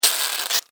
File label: Household Pesticide Can Spray; Yard Tools